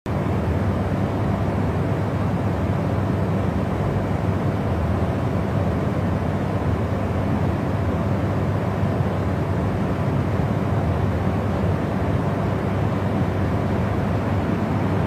Air conditioner

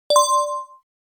achive sound

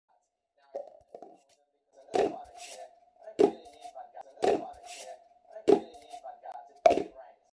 paper cup

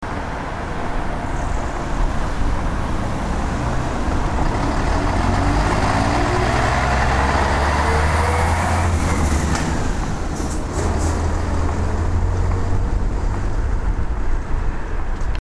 road traffic noise at a

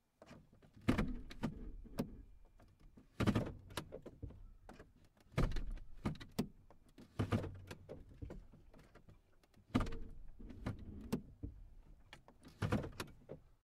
car switch lever

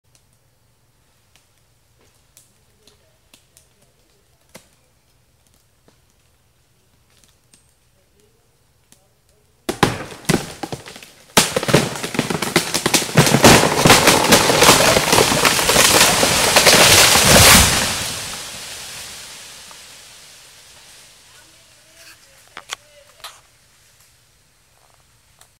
falling tree

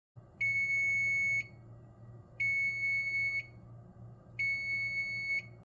oven bell

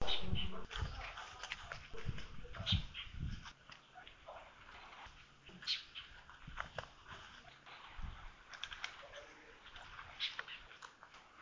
Lesser elaenia; پرنده ابروزردک کوچک

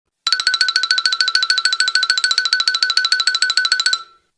Cartoon walking

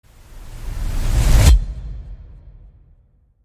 crescendo

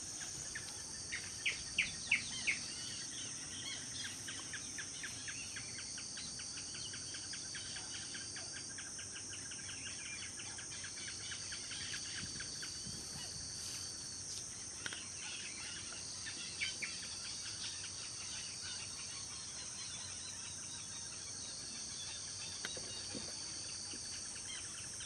Band tailed hornero

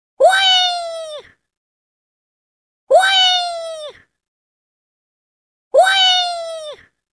External speaker SMS ringtone; زنگ اس ام اس سخنگو خارجی

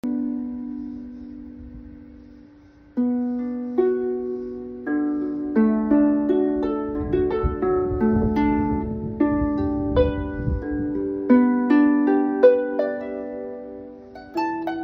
harp

